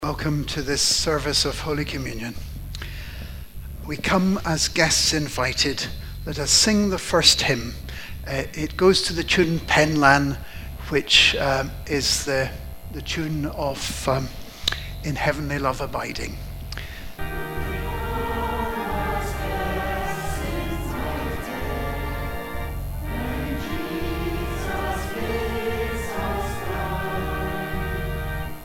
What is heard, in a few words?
hymn